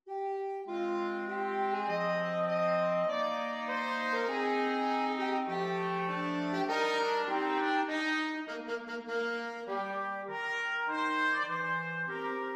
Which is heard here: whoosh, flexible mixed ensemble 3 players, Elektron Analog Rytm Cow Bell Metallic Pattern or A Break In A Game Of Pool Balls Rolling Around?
flexible mixed ensemble 3 players